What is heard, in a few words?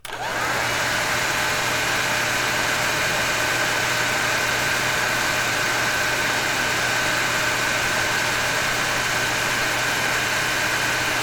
dryer